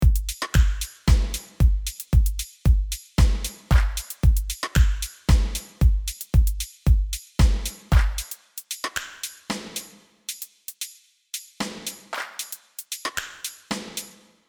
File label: Drums